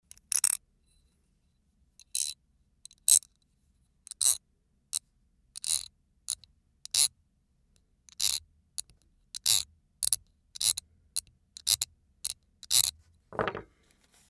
tool box

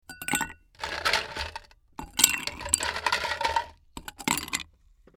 ice

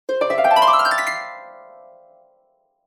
harp

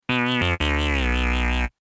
disappointment jingle

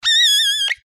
comical cute

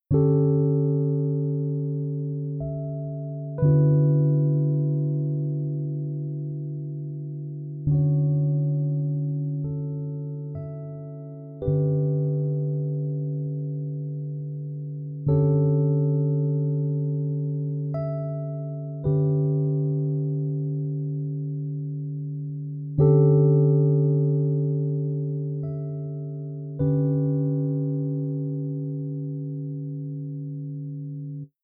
drone

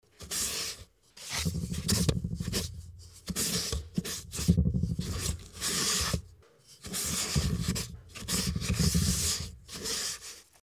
brush painting

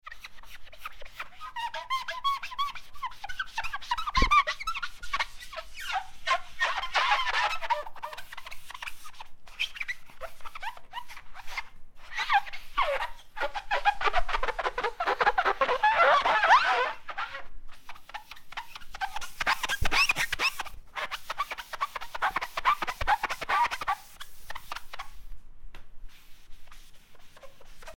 window cleaning

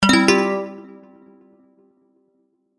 kirarin

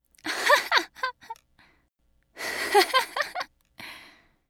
lady laughter

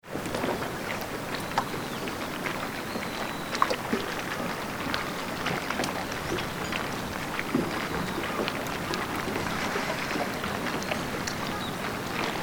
harbor

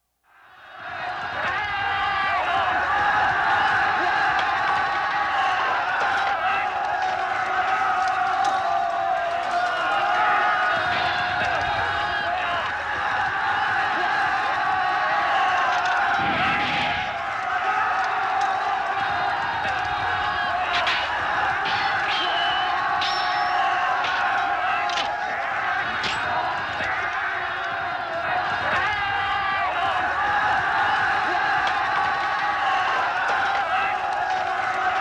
Japanese battle atmosphere

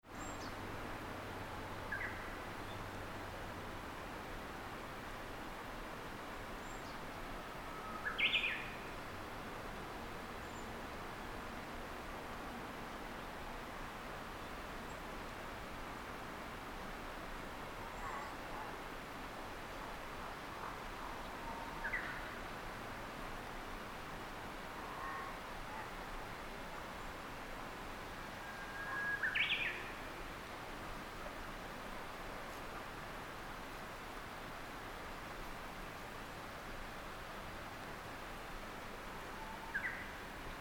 Japanese nightingale

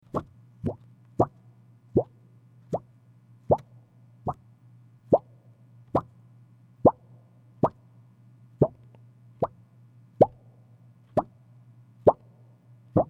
plastic sheet

